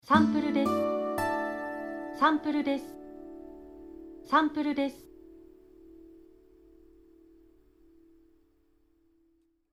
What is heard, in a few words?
Logo Guitar